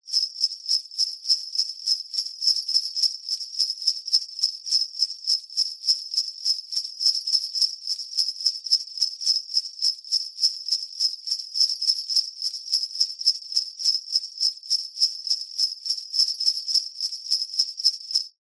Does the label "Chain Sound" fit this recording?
No